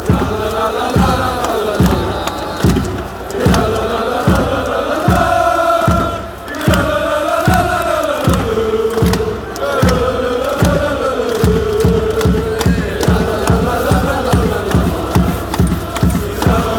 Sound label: jalalalalalala fan chants